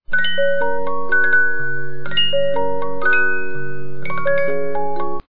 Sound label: La Traviatta, 50-Note Movements